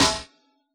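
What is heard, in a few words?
snare drum HipHop, Yamaha DJX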